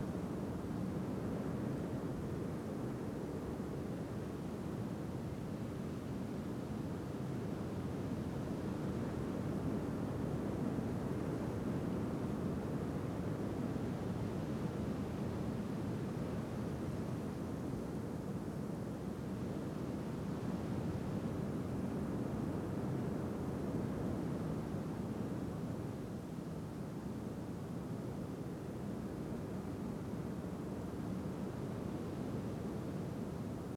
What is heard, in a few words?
Ambience Neutral; u3d guided alien shop